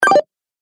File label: Retro Videogame Fx Drop Bonus